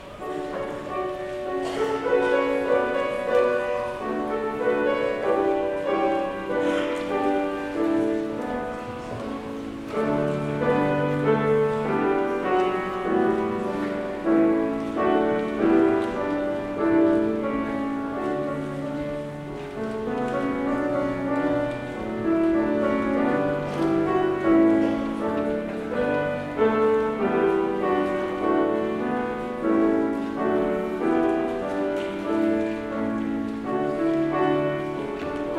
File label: Piano; Behnke